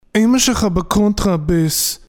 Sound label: contrabass